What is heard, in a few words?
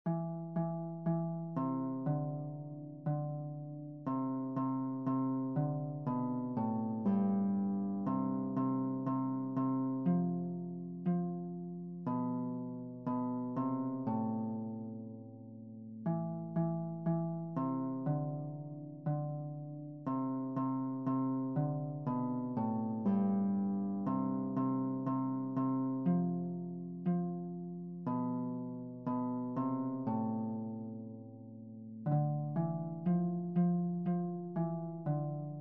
Score Melody; Child Laid In A Manger